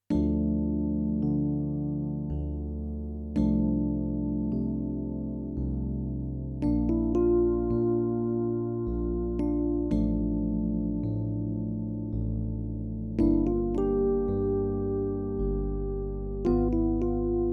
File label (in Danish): Tenor